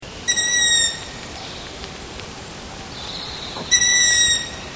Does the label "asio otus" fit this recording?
Yes